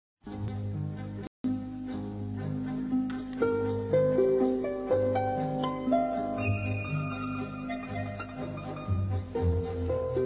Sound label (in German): Romanze einer Harfe